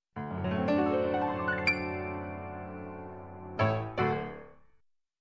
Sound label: Elegant Piano Ending